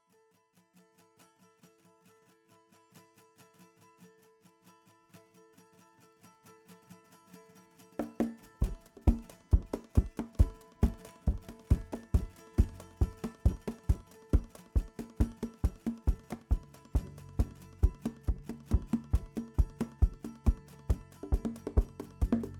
Three Guitars Percussion

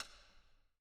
Baton Tap

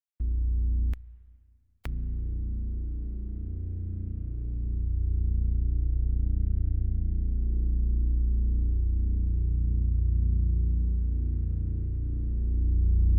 Session Noise Sub Bass Pad

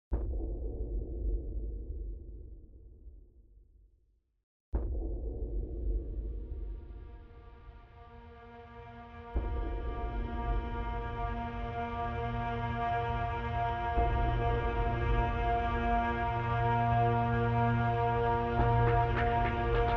Session Noise

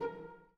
Pizz

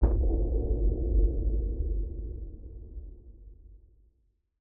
Baton Boom

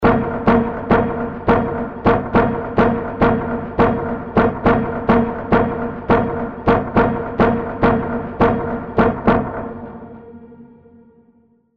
Session Noise Main Groove

